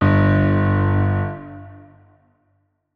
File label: notes, keyboard piano; Hideout Furniture